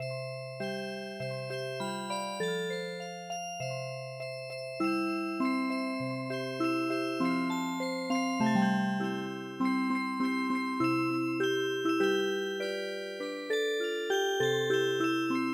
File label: Music Box, traditional